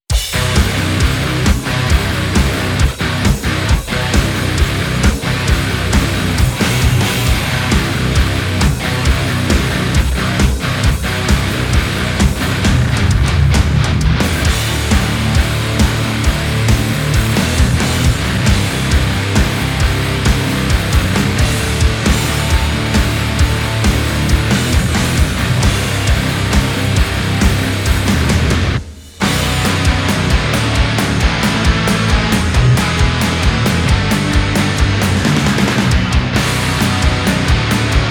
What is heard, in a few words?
higain tonex